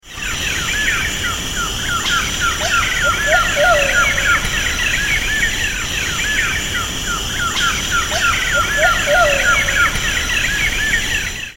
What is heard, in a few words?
Patagioenas p pallescens; R Tarumanzinho; Silveira